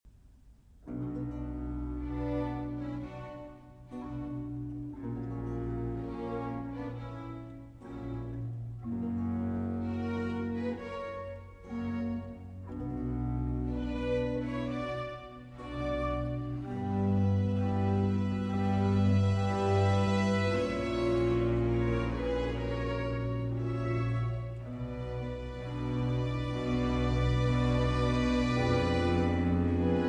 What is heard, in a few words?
Cello Concerto in C Minor, Adagi; Vivaldi; RV401; 2. Adagio